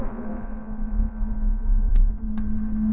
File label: door creak; sound effects